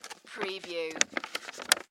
Envelope Manila Open; Sound Effects Bible

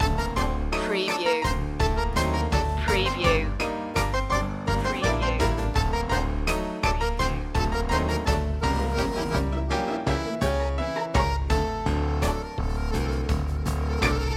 circus tango loop

